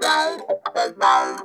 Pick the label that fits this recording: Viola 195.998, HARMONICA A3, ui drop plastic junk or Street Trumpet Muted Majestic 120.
HARMONICA A3